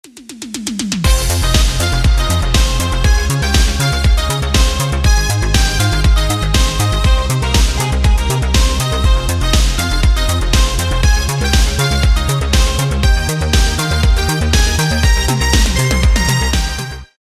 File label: instrumental